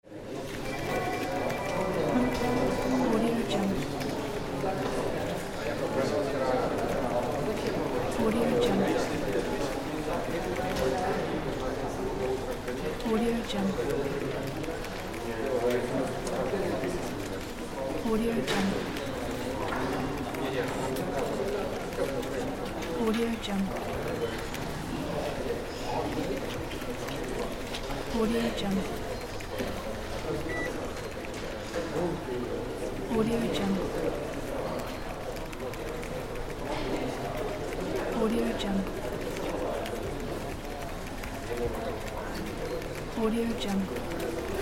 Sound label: office ambience